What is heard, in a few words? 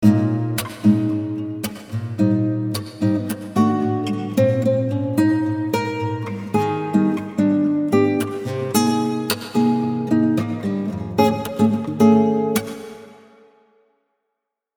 acc git wet variations